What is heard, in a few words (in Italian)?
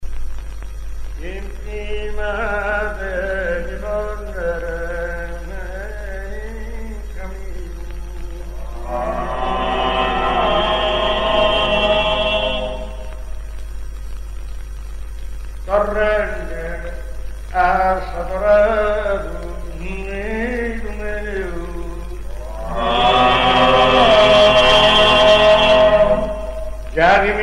ottava improvvisata, canti polivocali; thiesi ss